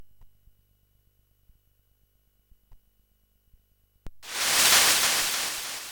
sfx; blinkys scary school; Sound Images; Tony Williams

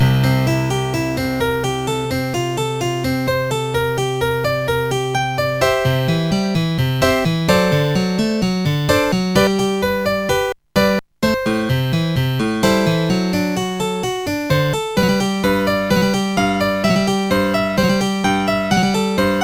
prelude, OctaMED; timetunnel

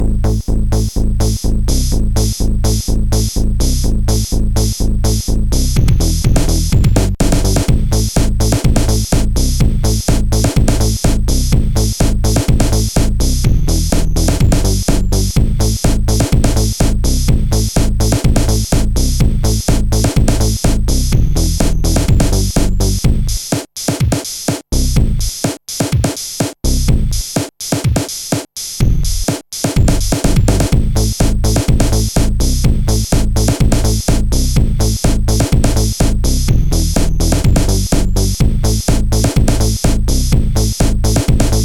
bass loading; Trackers; Modland; Maze

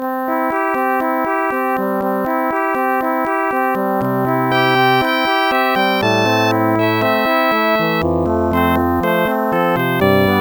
folk song; The Music Studio

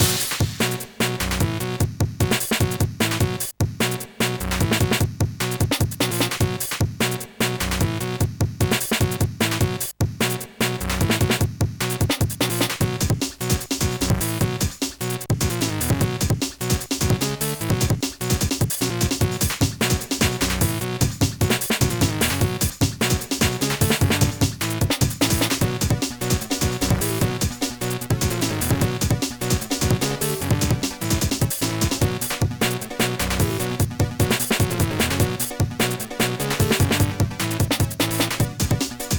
techno module; MultiChannel Tracker Module

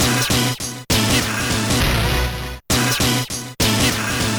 beatbox; BLAIZER

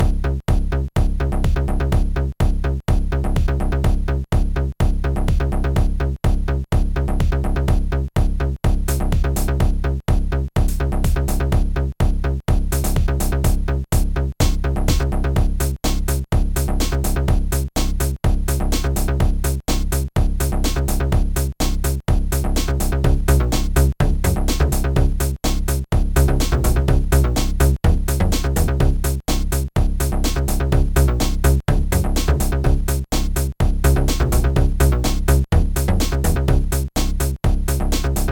rythm'n'dance, chiptune

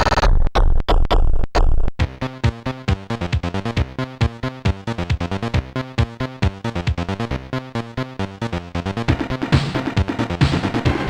electronical move; Sonix Music Driver

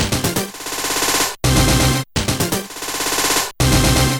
beatbox; Pinball Dreams

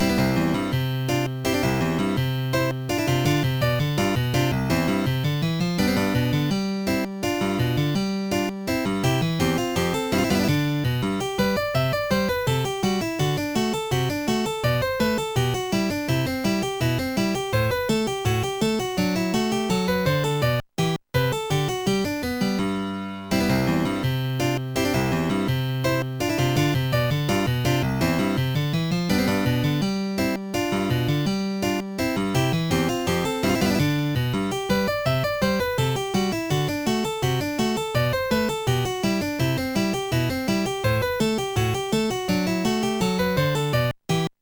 prelude; OctaMED MED

